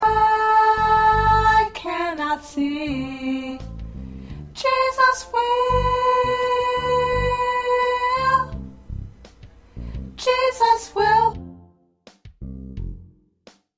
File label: Soprano, choir